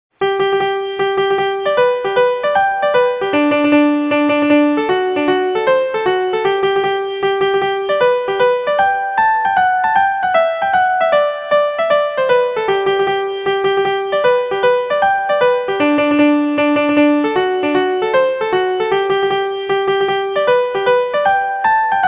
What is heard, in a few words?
trumpet hornpipe; The Celtic Room sessions